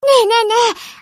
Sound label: alert